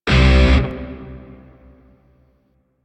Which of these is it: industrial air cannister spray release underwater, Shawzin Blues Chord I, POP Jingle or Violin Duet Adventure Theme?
Shawzin Blues Chord I